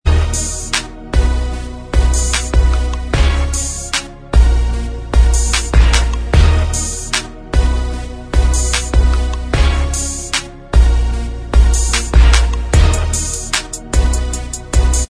Inst Track, backing tracks

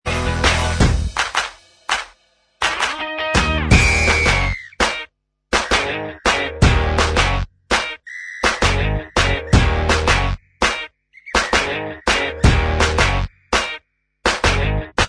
Inst Track

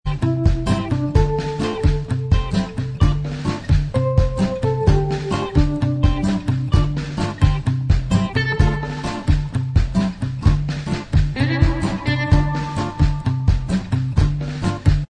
Inst Track

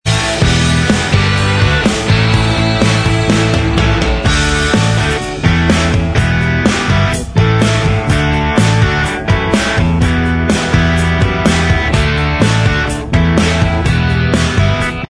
Inst

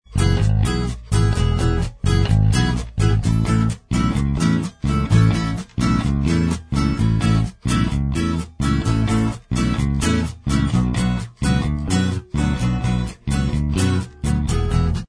acoustic Inst

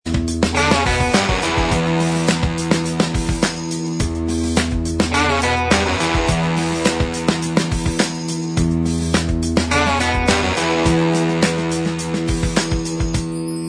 Inst

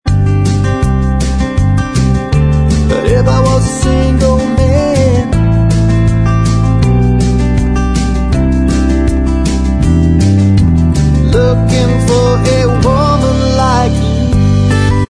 Bvox

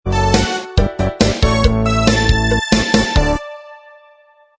theme Inst Track

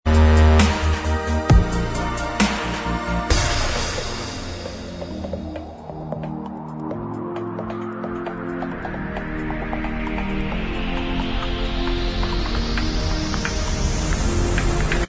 dubstep remix Inst

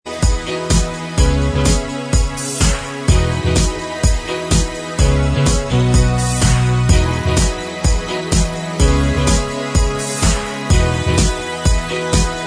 Inst Track

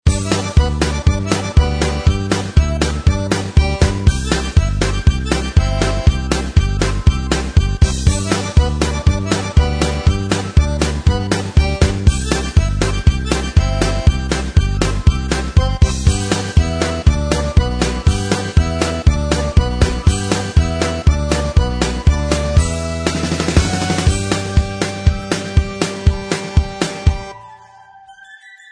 Inst

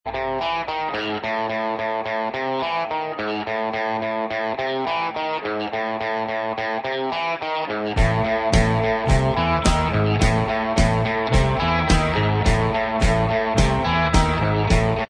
Inst Track

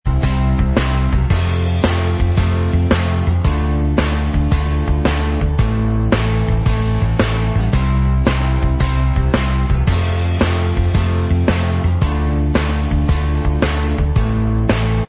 Inst Track

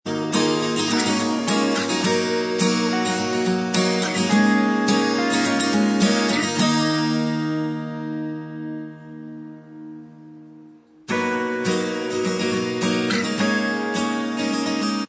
Inst Track, backing tracks

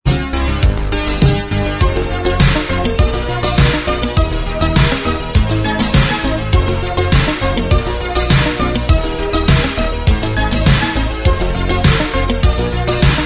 Inst

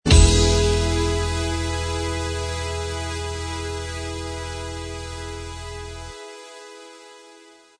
theme Inst Track

